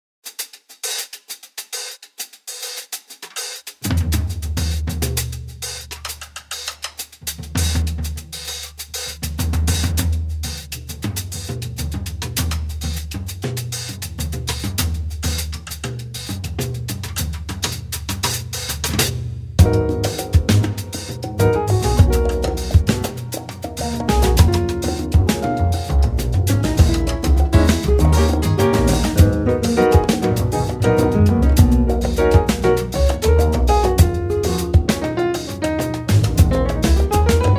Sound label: Latin Piano Excerpt